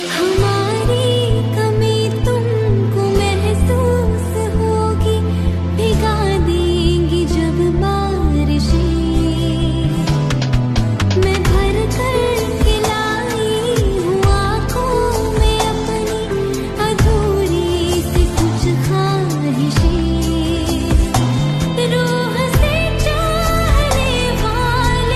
Sad Ringtone

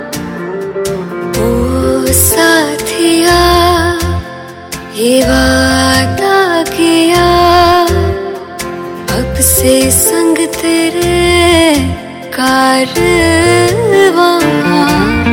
Female Hindi Song Ringtone

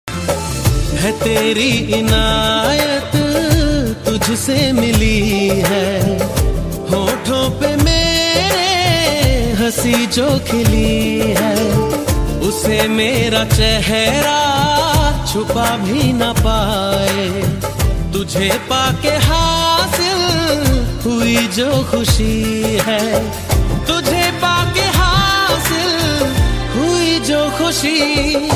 Hindi Sad Love Song Ringtone

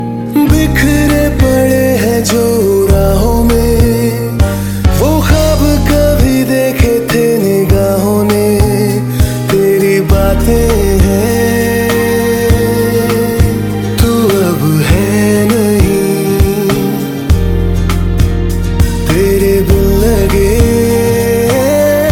Sad Bollywood Song Ringtone

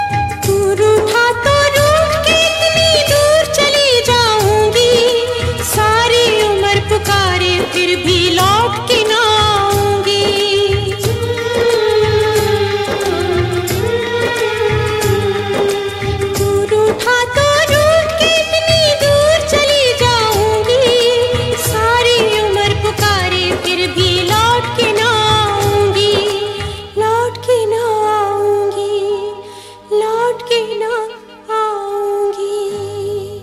Sad Hindi Song Ringtone

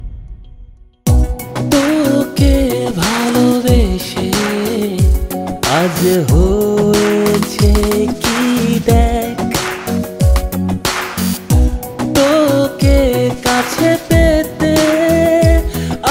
Bengali Song Ringtone

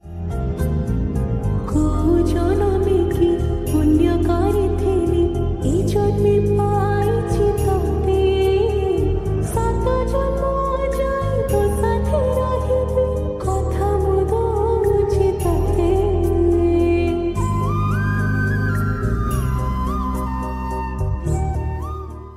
Odia Romantic Song Ringtone

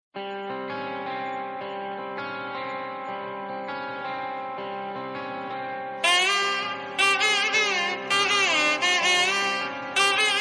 BGM Malayalam Ringtone; ringtones; Kantara

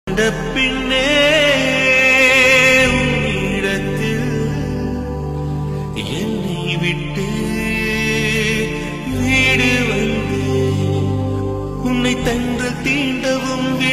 Tamil Movie Ringtone

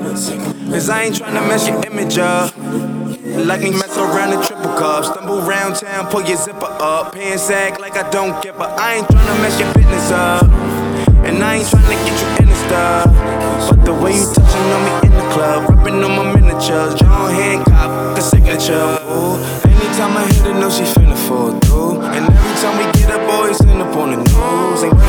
Remix Ringtone

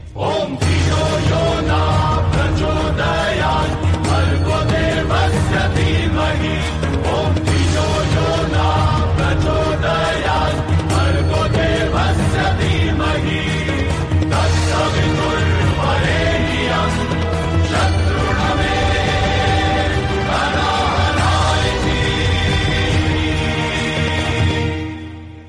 Bhakti Ringtone; Brahmastra

